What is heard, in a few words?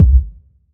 dope steel kick drum c key, kick drum tuned to the C note